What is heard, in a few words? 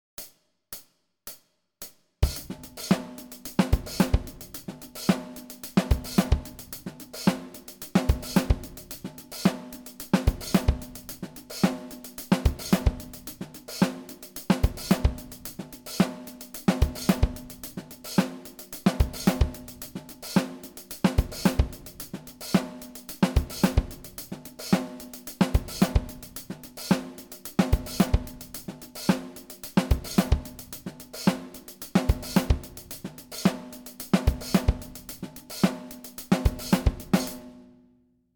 Verse 110bpm, bass